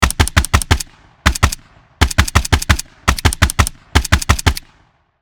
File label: Slow firing machine gun multiple bursts; guns